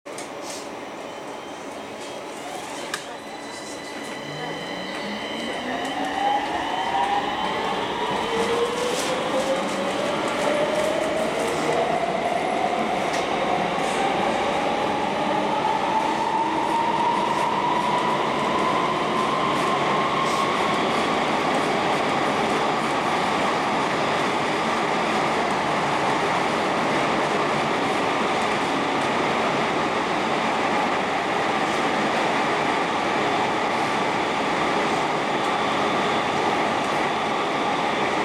Metro G1 Journey, Rejse med Metroen samt ankomst Nørreport; Metro G1 driftslyd; Emil Glentvor, Oktober 2022, Metro